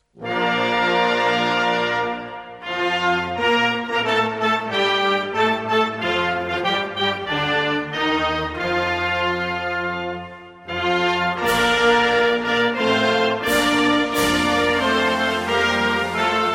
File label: Sound recording of the anthem; Drzavni simboli